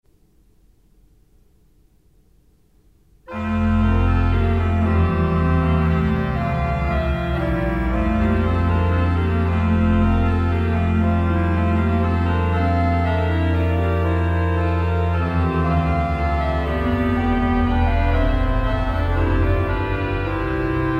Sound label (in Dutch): Holfluit en Basson Hobo met Subbas en Koppel